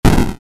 Break Small

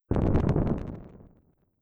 Flame

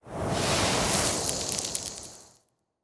Cursed seeds

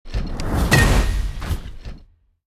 Crush

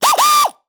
Air Ratchet Dry Burst

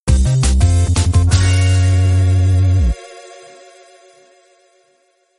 Fx Win Best; Halloween Global Candy Cup 2015

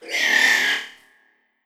Spider

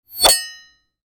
metal whoosh hit; Ion Shift

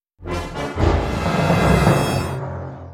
Hudmessage Fanfare Negative; Burnout CRASH!

